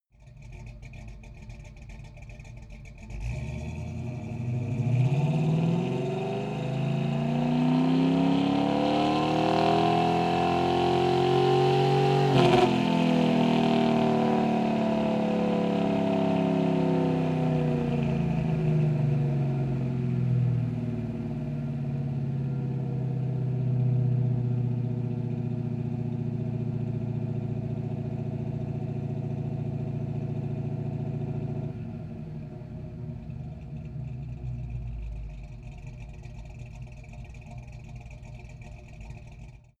car camaro accelerate decelerate heavy exhaust

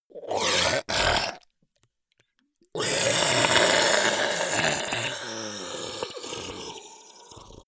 zombie grunt choke male slimy; kreaturen

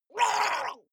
water monster female gargle shout attack short; koerpergeraeusche; comedy-cartoon; soundpool

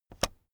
keyboard external space release, computer; kommunikation

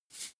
salt shake single; berufe; gastronomie

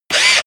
drill dull; maschinen; bohrer; soundpool

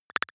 click stutter, signale; soundpool

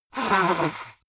nose blow big; soundpool

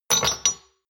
shot glasses stack hard; soundpool

